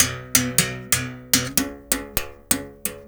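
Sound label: BS BERIM GRV, BERIMBAU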